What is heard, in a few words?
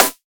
snare organic